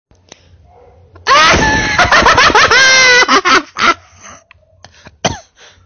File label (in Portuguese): risada macabra